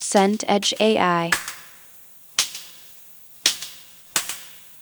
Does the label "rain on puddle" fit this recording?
Yes